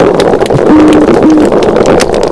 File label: toadfish call